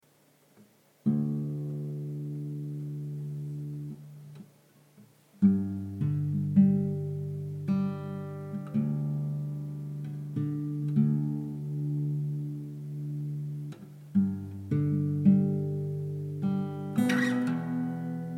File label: D110 GTR Impro